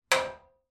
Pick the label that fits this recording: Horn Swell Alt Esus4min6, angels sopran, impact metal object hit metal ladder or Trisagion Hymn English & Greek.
impact metal object hit metal ladder